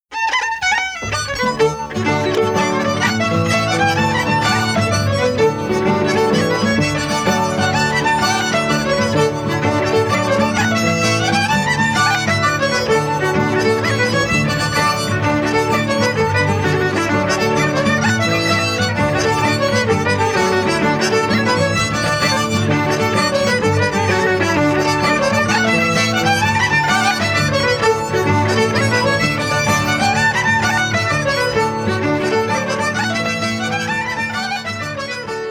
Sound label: Jig; Inverness Jig